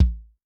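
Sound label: bass drum dry, Kick, Yamaha DTXpress-II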